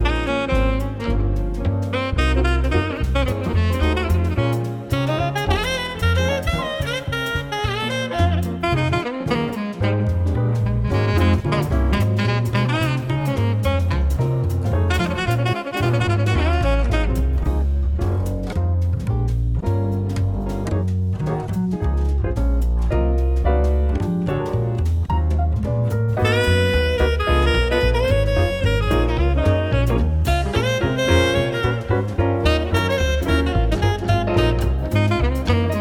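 Muzyka dlya chteniya vibrofon, music for reading vibraphone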